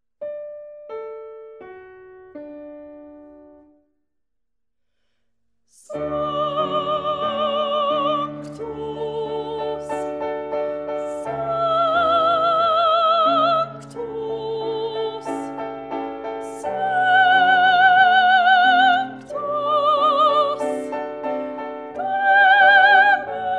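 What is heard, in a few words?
soprano; sanctus; schola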